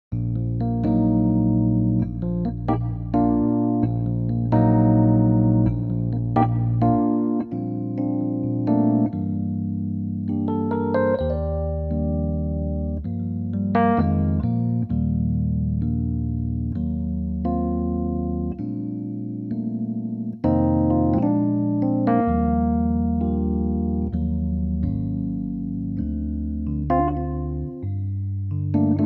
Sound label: Bb piano